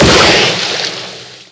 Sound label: Shoot; Skylanders Cloud Patrol; Arcade, iOS, Mobile; ThumpBack SFX